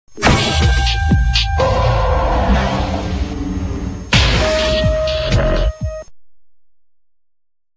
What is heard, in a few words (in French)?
jingle pub; w9